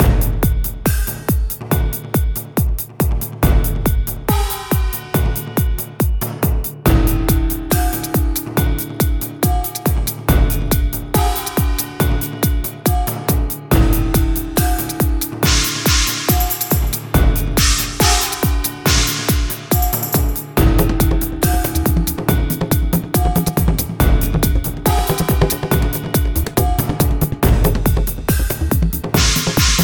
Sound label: Asian beatz Riff